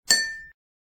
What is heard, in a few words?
Beep; i-mobile; i-note-IE6010